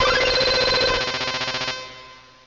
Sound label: Hyper Beam; pokerogue; battle anims